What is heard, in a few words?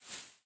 Footstep Foliage Grass